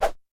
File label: Swoosh; Dino Donkey Dash; Swoosh Sign